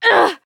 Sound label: Grunt Physical; Realm Royale; Mage; Technomancer